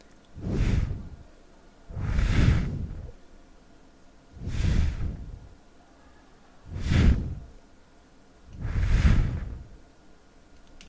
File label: Blow On Mic